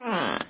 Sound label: mystery minimize; windows 9x; sound schemes